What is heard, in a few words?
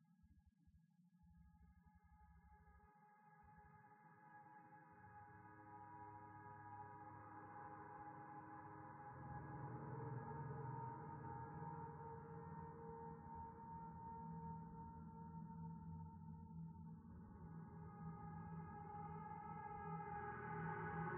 Pad Second Interior One